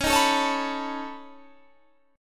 Db 7th Suspended 2nd Sharp 5th Db down Guitar Standard; Db7sus2#5 strummed; JGuitar